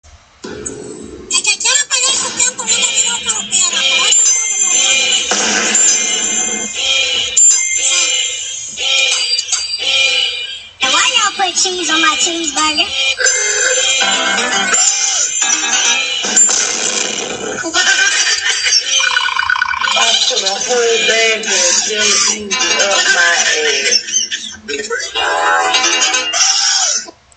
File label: goofy ahh ringtone sounds